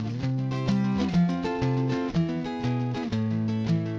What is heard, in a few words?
guitar test